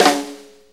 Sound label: Blues Snare Flam; Sonic Foundry (Sony Creative Software); Drum Tools; Flams